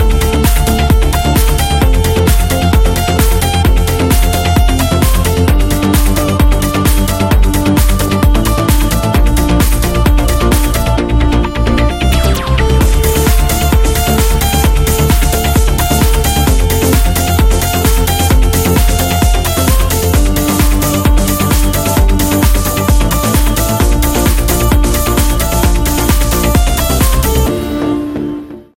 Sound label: Violins